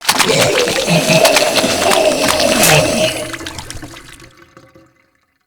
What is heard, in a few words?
Vomit Attack, Infested, Crawler, Nauseous; Characters